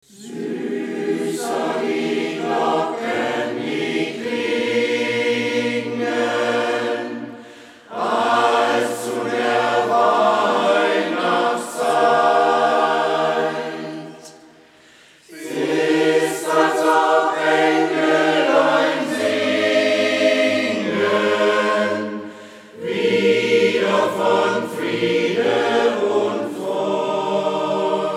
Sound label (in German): Chor Süsser die Glocken